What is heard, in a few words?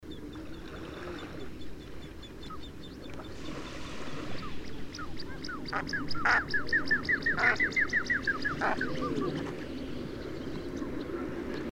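common shelduck wing sound flight call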